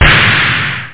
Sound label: ELEC SNARE